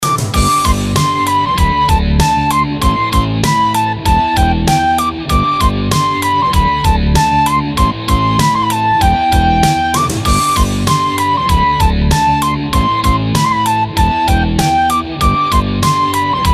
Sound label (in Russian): melodiya na zvonok